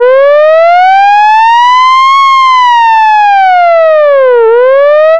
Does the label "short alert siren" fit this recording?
Yes